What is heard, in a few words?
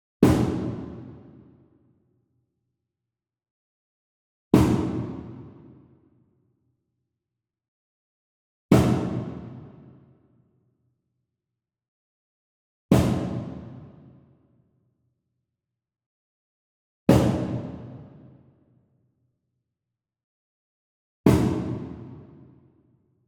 Wood Percussion Cajon Hit Coconut Beater Far; boom library; Cinematic Strikes Construction Kit